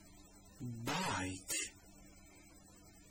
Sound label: bike